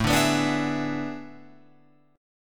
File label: A Major 7th Suspended 2nd Suspended 4th, Guitar, Open F; AM7sus2sus4; strummed; 9,9,11,9,11,11